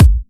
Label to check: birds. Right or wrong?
Wrong